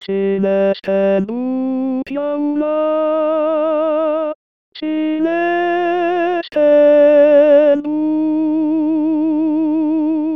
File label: Sine dut Tenor